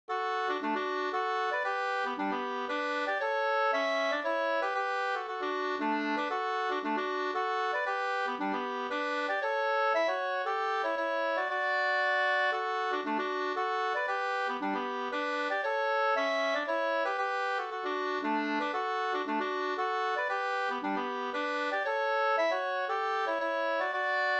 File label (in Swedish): hamburska; Smedjebacken e Edvard Pettersson Sd